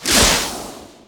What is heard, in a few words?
Frost Arrow